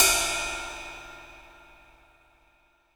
Boss DR880 cymbal; drums

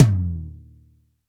Real T2, drums, Roland R8 MKII

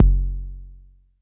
Long bd, Jomox Airbase99

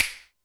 WideSnap, Alesis D4; drums

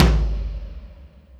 Lo Flr Wet, drums, Alesis D4

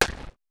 perc, Roland MC-909

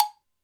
HiCow, Alesis D4; drums

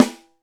Alesis DM5 Snare; drums

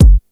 kick, Yamaha RY-8

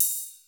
ride, Roland MC-909

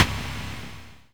Z1 Snare, Korg Z1

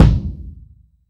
Boss DR880 kick; drums

